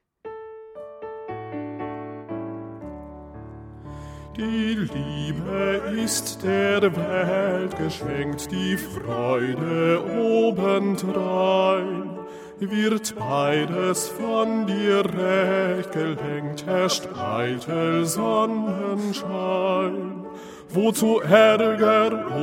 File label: TTB Bass1; maenner